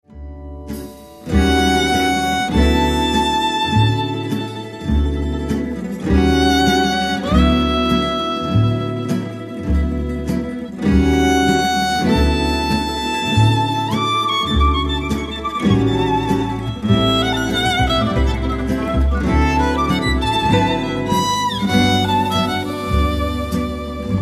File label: Jazz Band